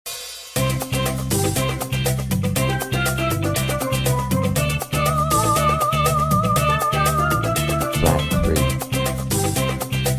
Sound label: Calypso Key of C; real world music ear training